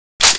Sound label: m9 mag in